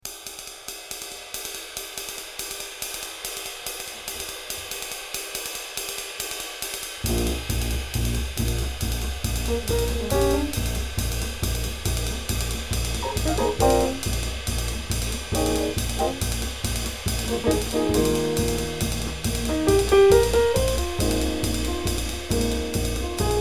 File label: trio mix